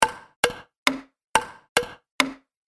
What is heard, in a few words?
hammer Chop